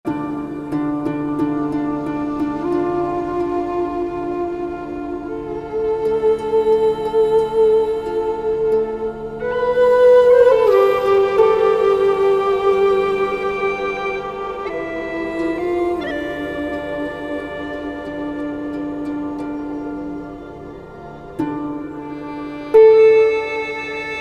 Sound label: loop, music